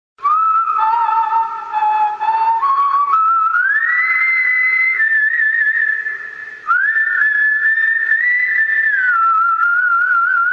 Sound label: The Whistling Drunk